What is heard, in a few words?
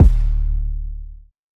kick